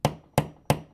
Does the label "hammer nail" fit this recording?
Yes